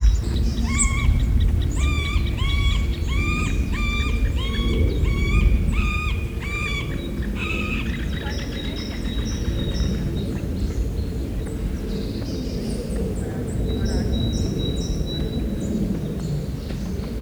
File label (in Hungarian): a him tavaszi hangja, kozep fakopancs; Visegrádi-hegység